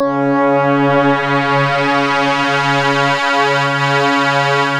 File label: C#, Synclav FM; Big Fish Audio; SPACE1